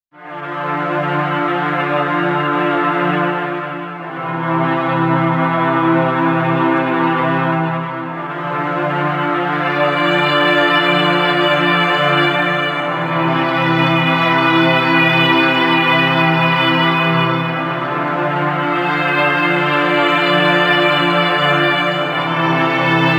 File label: Akai MPC 2.10 Mellotron